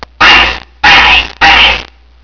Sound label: bang